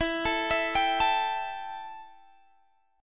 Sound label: E Minor Double Flat 5th E Guitar DADGAD